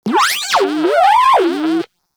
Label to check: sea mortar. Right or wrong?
Wrong